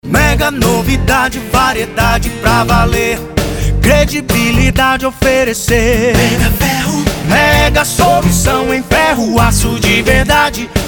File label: jingle